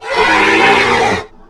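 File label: mutant death; lmctf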